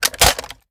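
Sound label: assault rifle reload mag out; gmod; viper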